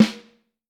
Vintage Snare Drum

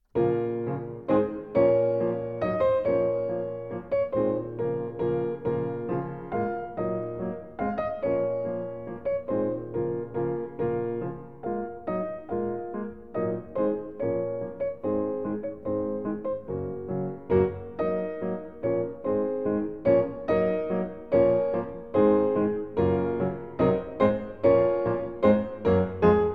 Tibetan Melody; Fourth Way

